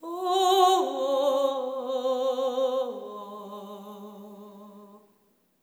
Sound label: LAMENT